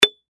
Ceramic Dish Lid, Ceramic Dish Lid Close Hard Impact; Rocks; Foley